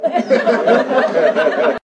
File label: laught